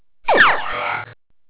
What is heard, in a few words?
phasers; warlock